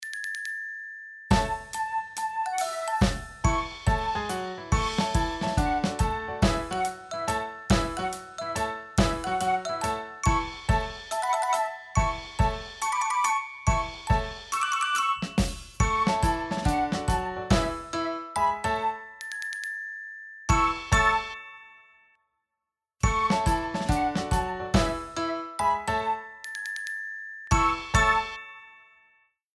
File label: karaoke